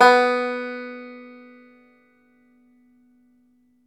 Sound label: FLAT TOP, Flat Top Banjo